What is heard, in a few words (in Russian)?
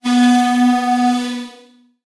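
низкий звук гудка теплохода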